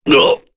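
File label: Vox Damage, Korean; Dakaris; kingsraid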